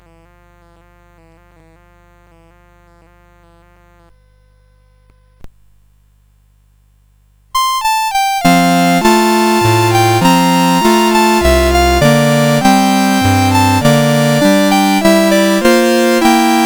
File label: Piano Concerto, CSG8580R5; No1 Op23